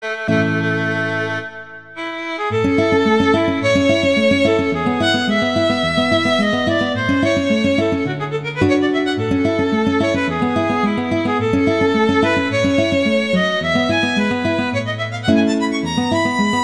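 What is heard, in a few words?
Sonata Larghetto, Violin Guitar; Paganini; Sonatas; Op2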